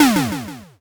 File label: explosion s